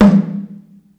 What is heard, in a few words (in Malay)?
geduk anak